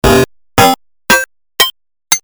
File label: heifer bell B; Commodore Amiga